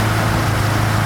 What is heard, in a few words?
engines; World of Tanks